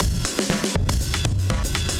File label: Beat Style, 120bpm, breaks